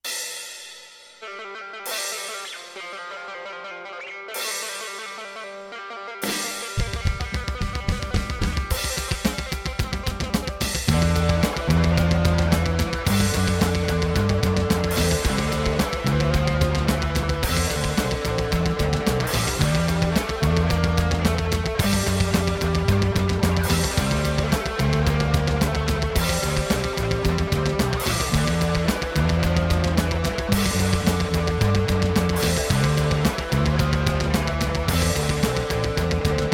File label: rolling blues